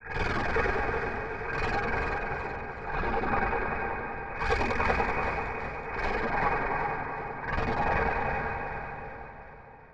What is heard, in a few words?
Alien Breathing